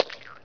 Worm Die; worms2

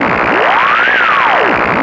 noise

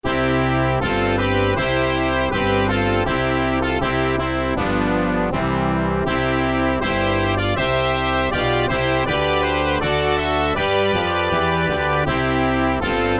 hail

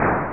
firing; OIDS

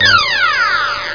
GLISS

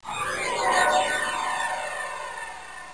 Passing Spacecraft; Vehicles

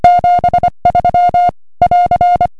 CW; FT-747GX

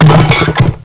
burst; Take 2 v1.0

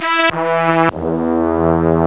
Trombone; DeluxeMusic2

